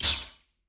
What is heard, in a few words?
anime hit; SoundsCrate